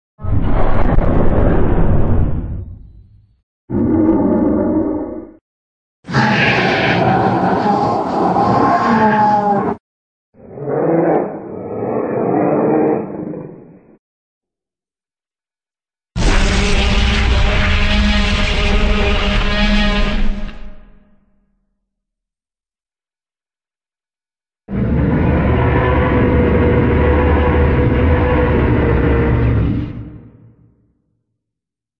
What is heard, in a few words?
boarlion sounds